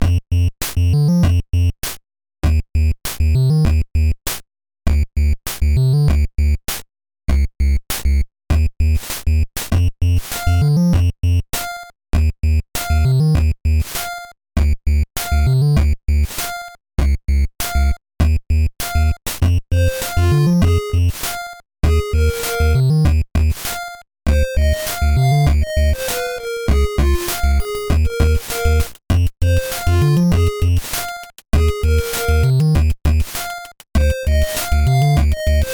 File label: nes beat nes vrc7; weeklybeats 2024